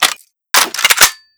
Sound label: dshk reload end; dhsk